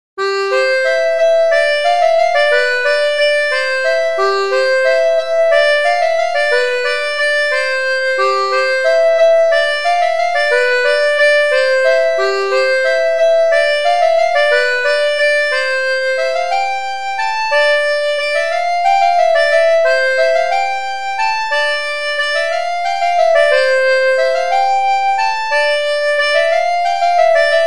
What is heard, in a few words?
bourree